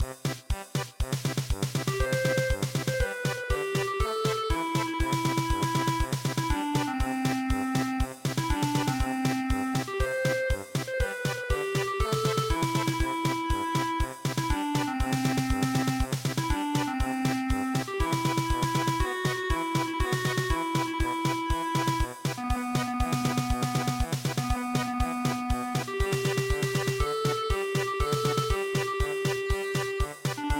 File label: Onino Pantsu polka; Funiculi funicula